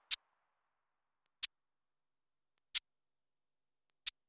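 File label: maracas; Yocto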